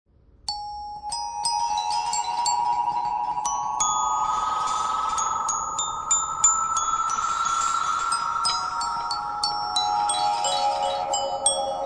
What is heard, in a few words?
glock; shutt-E